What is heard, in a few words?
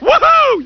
woohoo